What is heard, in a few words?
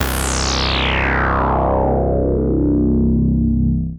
C1, Synth Hits; Zero-G; Soundclash Nu Dance Electro; Acidized